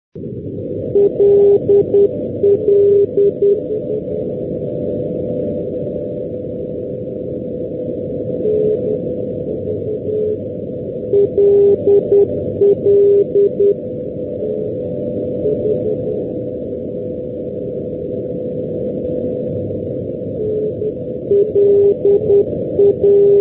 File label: ndb + ndb; amsterdam-schiphol; lille; middelburg